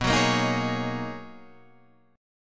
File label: B Major 13th B, Guitar, Standard; BM13; strummed; JGuitar